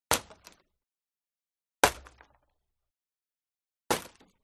WEAPONS BOW & ARROW Impact Sweetener Wood Shatter Soft